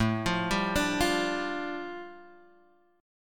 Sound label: A 7th Suspended 4th Sharp 5th A, Guitar Drop D; chord